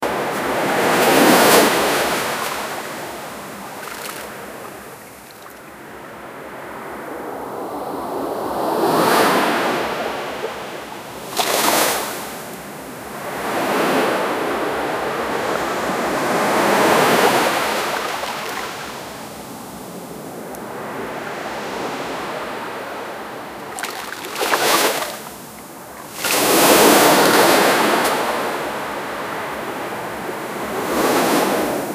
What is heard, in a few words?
seaside, beach